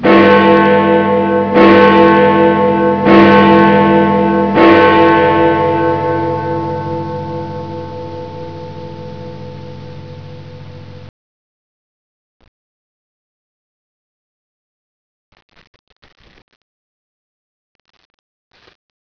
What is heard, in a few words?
cathedral bong